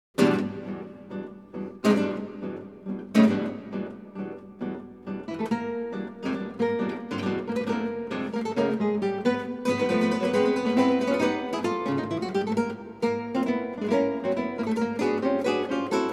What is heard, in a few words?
Guitar Quartet March